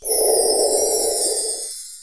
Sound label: magic cancel; battlecastle